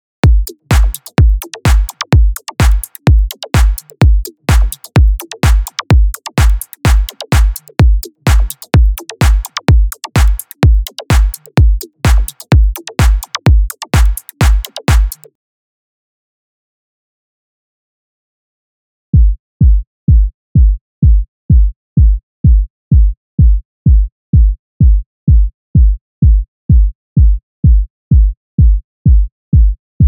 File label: 127 Bmin DRUMS